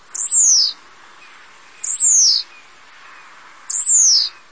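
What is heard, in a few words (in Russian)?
звонкое мелодичное щебетание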